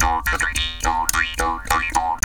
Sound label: Jaw Grooves, Didgeridoo and Other Primitive Instruments; Big Fish Audio - Didgeridoo and Other Primitive Instruments